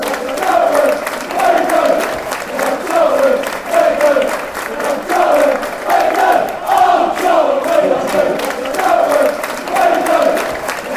we love you charlton, fan chants

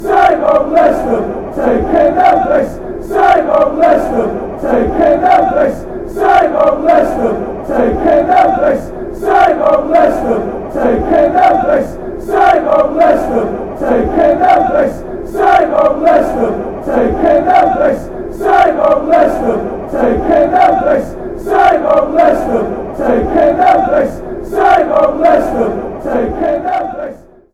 same old leicester, fan chants

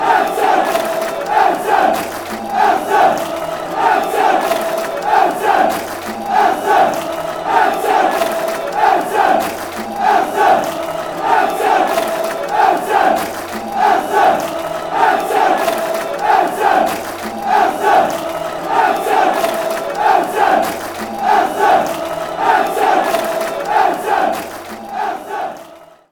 claps, chants; everton

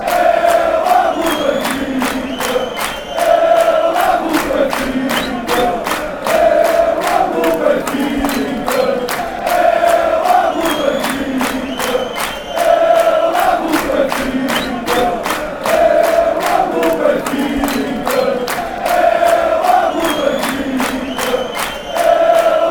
i love benfica, chants

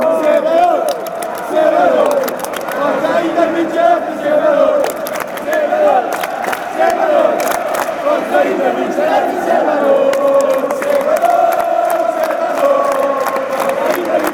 with us, fan chants

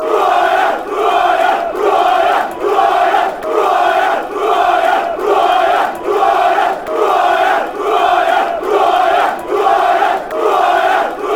who are ya, fan chants

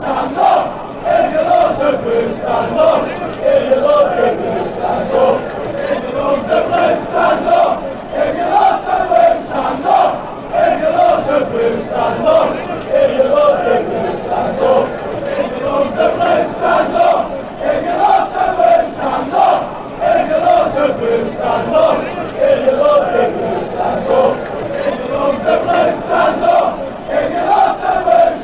stand if you love blues fanchants

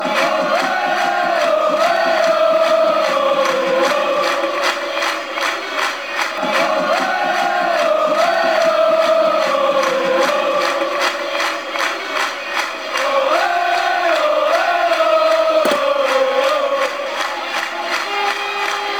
ole ole oh ole oh fanchants; apche

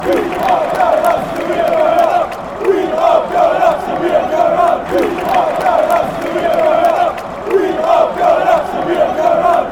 we are going up, fan chants

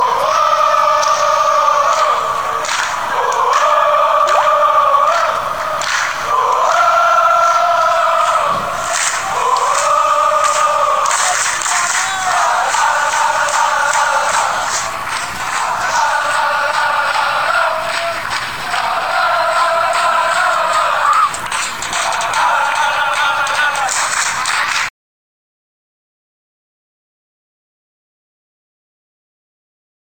oooooooooo lalalalalala, fan chants

